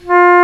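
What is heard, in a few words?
English Horn p F2; Digital Sound Factory Virtuoso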